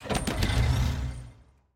fanfair

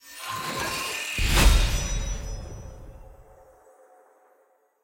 sfx capsule anim

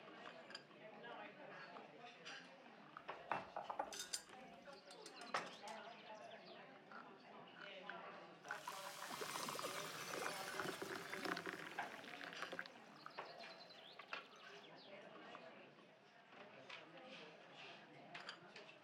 amb

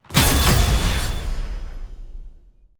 sfx player lockin; clash

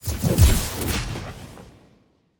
clash member banner intro

